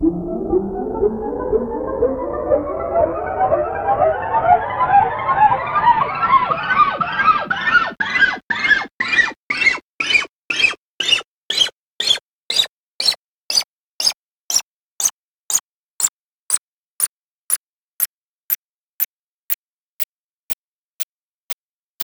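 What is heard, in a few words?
fret noise scale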